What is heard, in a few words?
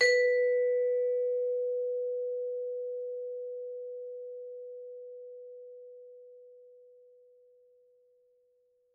Vibes hard B3, Vibraphone, Hard Mallets; Struck Idiophones